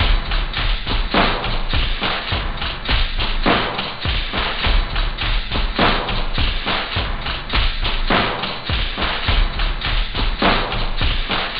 stomp, techno; OctaMED SoundStudio V1

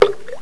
drop of oil

